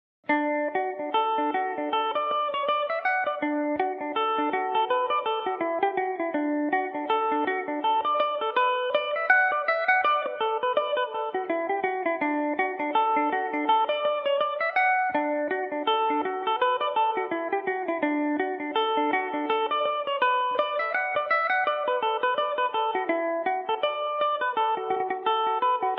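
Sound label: D major revelation, Irish mandolin; austin tierneys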